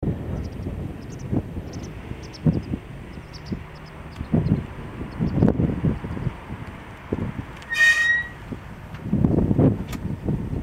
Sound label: BACK BRAKE NOISE; GDB